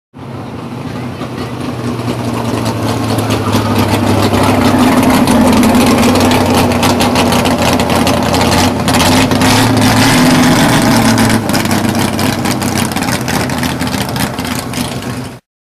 Chevy Camaro

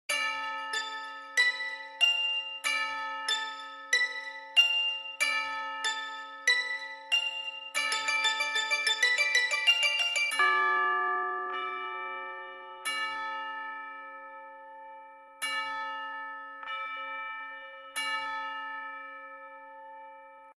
HIPHOP

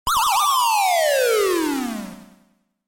Punch Out Knockout

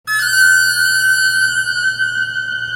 Halloween Stinger

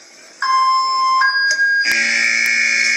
Chicago Fire Alarm

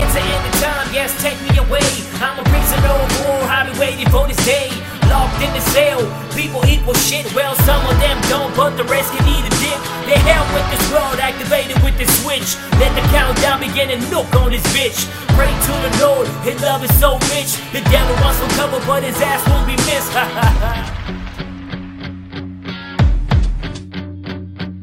Chorus

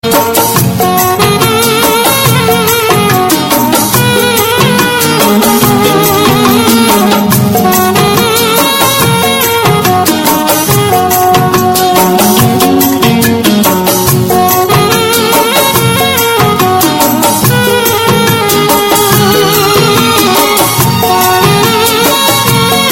Instrument

